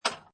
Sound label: Pinball Sound Clank With Rattle; Video Games (Sound Effects:Games)